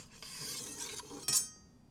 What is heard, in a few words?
Big Knife Unsheath; Micro Pack